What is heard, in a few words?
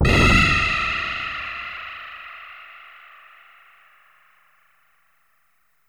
enemy kill; wob2 demo